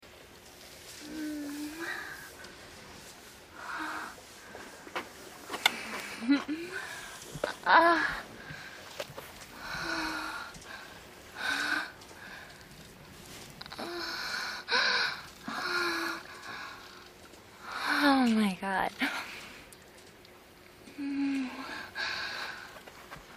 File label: moan